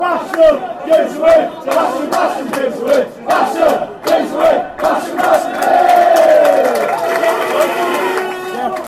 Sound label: give us wave, chants; basham